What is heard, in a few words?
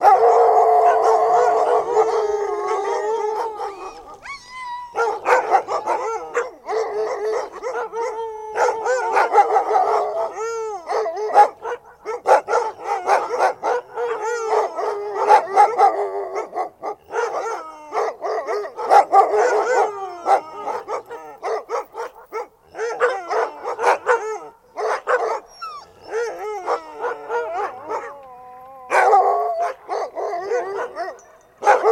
Dogs Barking Crying, husky